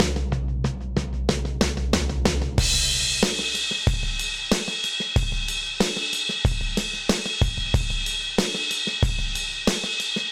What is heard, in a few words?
Ride Fill Old School 93bpm; Clockwork; Ableton; Factory Packs; Session Drums Studio